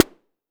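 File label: vermona drm1 mk3 snare drum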